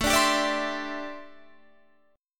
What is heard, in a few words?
D 9th Suspended 4th A